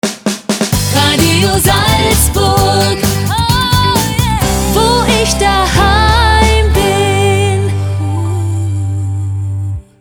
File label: transition; Radio Salzburg